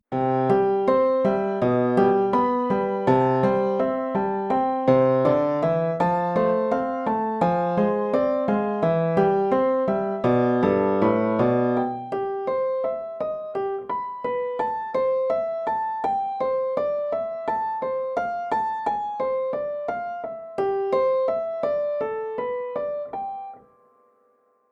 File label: Piano Practice